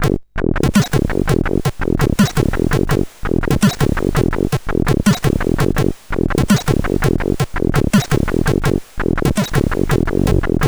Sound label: Hard-Hop, CSG8580R5